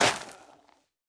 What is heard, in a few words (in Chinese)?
灵魂土破坏声